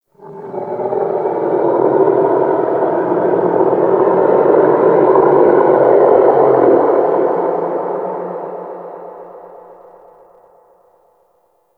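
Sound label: Shipyards distant moan one off, Ambience; Warframe; Grineer Shipyards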